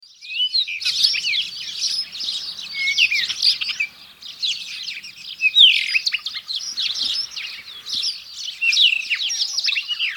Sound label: Black Scrub Robin; Saudi Arabia; AbdulRahman Al-Sirhan